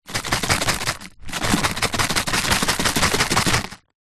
food potato chip crumbs shake in large packet; zapsplat

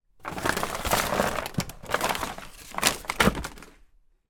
foley cards greetings many slide knock off shelf

